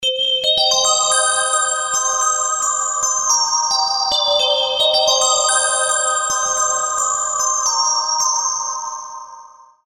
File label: MQ Princess Bells